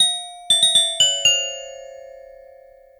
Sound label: Floating Condor